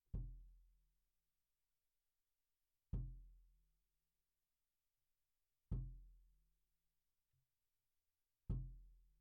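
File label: Snare Bottom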